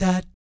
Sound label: DAT F#3, Jazz; ILIO; Vocal Planet; Jazz & FX; JAZZCOMBO